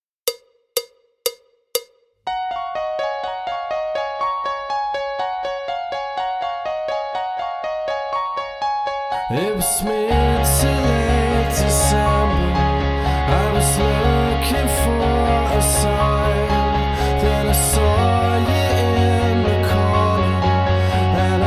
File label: backing track without click